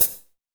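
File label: hi-hat, drums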